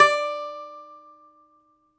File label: DOMRA, Russian Strings